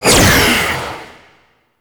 Scythe Proj Whizz; Stalker Jade Scythe; Warframe; Weapons

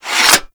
Hammer Sheath; Warframe; Foley

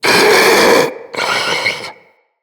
Infested Ancient Idle; Enemies; Puss Ancient

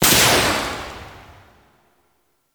Swarm Missile Launcher Fire 2D Tail; Warframe

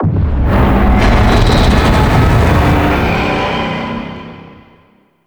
Dragon Passby Death Stinger; Ambience; Gameplay; Mainland A; Duviri

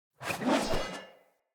Sphere Polearm Whoosh Fill Long; Weapons; Player

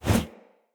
Player Torch Whoosh; Weapons; Blunt

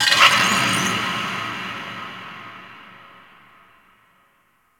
Metal Impact Large; Warframe; Ambience; Cephalon

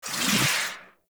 Mod Cycle Enter; Riven Mod

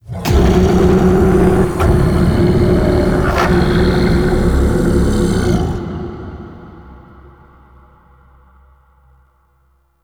Sand Vocals; Warframe; Man In The Wall

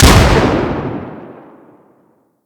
Weapon Beam Combust Explosion; Mods

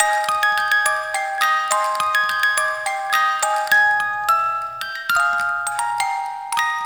Bunraku Puppet Loop; Warframe; Warframes; Koumei

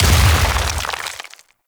Stone Death; Warframe; Brawler

